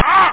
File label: argh; The Epic Collection 3; Epic Marketing; muzak samples